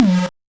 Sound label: Noise; musicradar; 8-bit bonanza